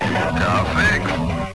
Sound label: car fixed; madcars2; radicalplay